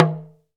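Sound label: Talking Drums; Roland - LA Composer vol. 1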